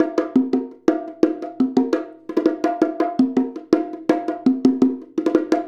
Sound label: Bongo; Essential Percussion